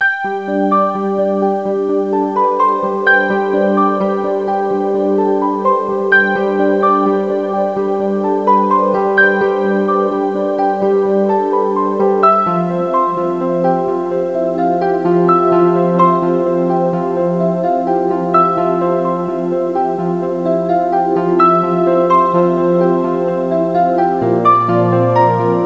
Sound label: mello; Tandy Sensation II